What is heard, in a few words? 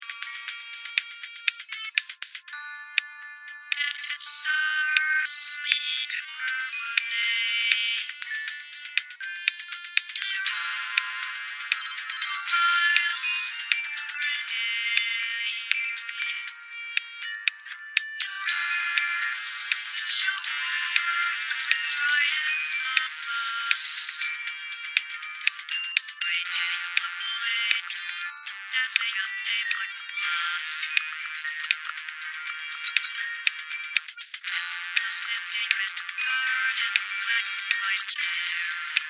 High Hz Bandwidth